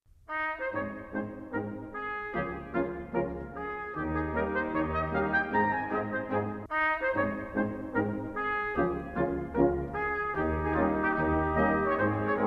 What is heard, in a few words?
Schottische Quadrille